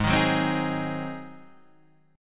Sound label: G sharp 7th; G#7; strummed; 4,6,4,5,4,4; JGuitar